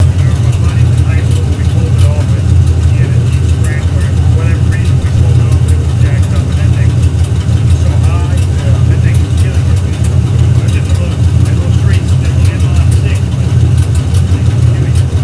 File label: ryans camaro idle